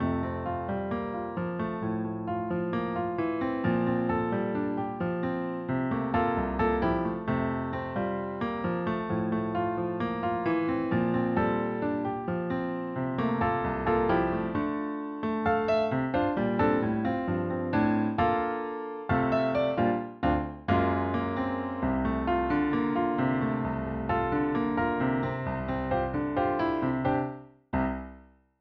male audition accompaniment

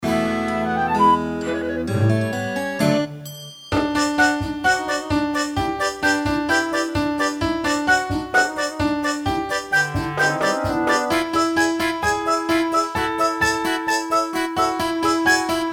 accomp plus lead

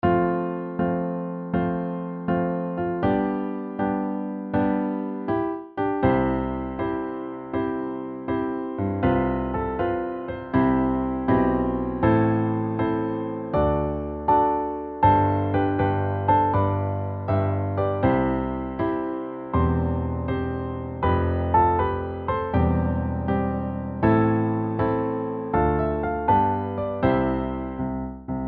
peoples song accomp

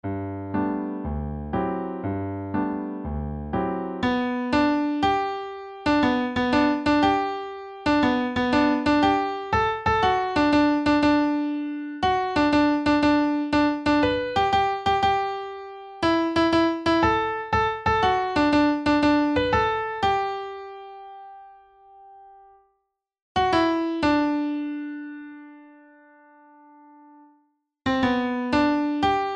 lead only

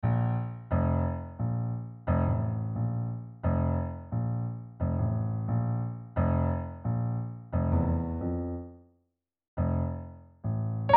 famtree accomp

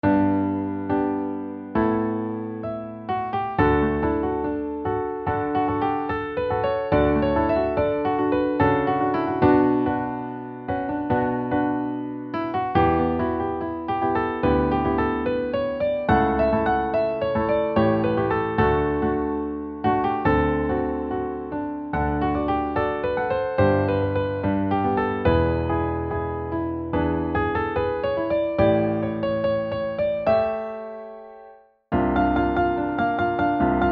rue plummet with lead

